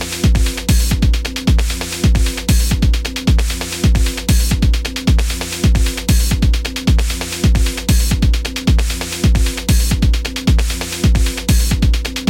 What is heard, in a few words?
locked groove